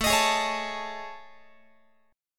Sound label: A Major 7th Flat 5th, Guitar; AM7b5; strummed